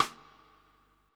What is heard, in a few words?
FX kit rim, drums acoustic; USB Soundscan vol.10; AKAI; 90s sample CD